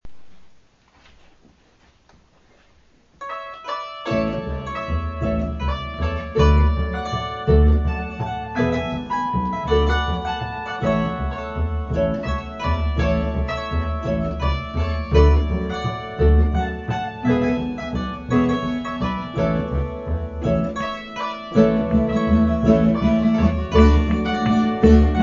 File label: Zamba